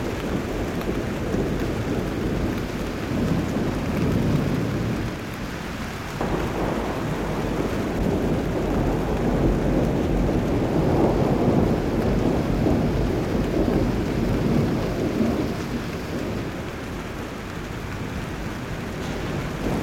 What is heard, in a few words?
wind rain